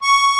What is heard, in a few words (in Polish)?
AKORDEON C#4